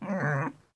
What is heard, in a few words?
pig ack; propack